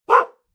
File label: dog bark